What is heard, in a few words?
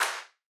Clap